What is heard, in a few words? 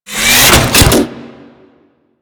Drone Latch On; Warframe; Vay Hek